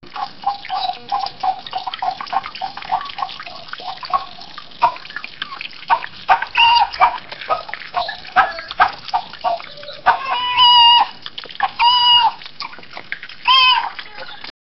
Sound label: a chef cook a chicken; food preparation